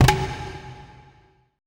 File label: E CGFLAME, ETH DRUMS